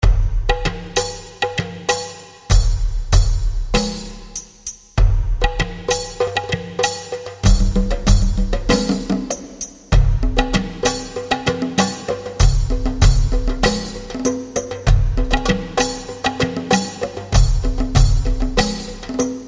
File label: Rhythm Chifetelli, belly dance